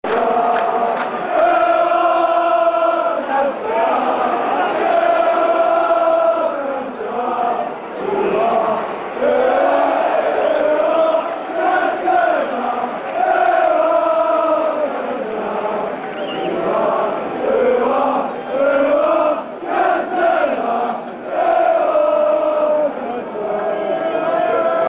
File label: Ooo Ahh; Cantona